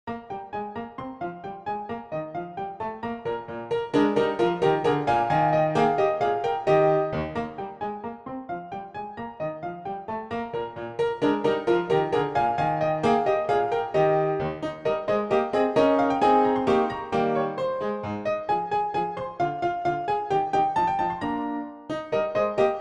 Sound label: Dance PianoTeq Bechstein